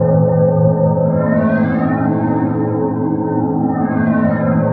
Mystery Chord, Keyboards

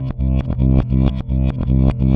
Backwards, Bass, Effected; Bass X

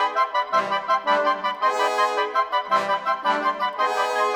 Brass Winds, Orchestral; Sonic Foundry (Sony Creative Software); Orchestral VOL-2

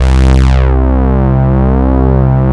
DANCE SYNT, Mostly Leads

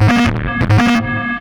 SEQUENCOR, Hard & Loud Techno; USB Soundscan vol.01; AKAI

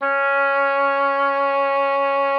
C#4, OBOE; Xsample VOL-6

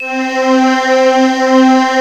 SPIRIT BELL, KAWAI; Rubber Chicken Software Sample Bank

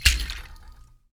HAND, Afro Shakers, Ankle Pods; Roland LCDP14 Africa VOL-2

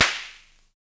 Snare, Univox SR-95

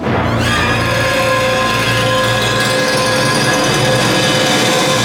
A#3, symphony; Northstar - The Wizard VOL-1

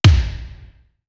BIG O, TOM; East West - Global Sounds; D4 BD SD TOM

